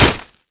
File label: knife hit wall; Gut; cstrike; JailBreak